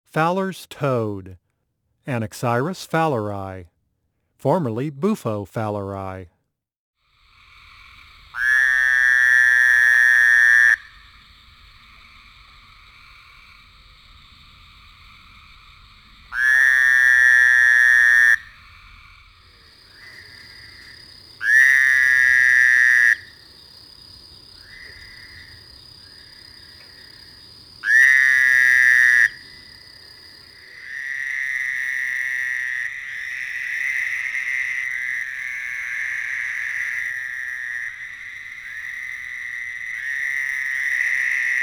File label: Fowlers toad, frog calls; amphibians; frogs and toads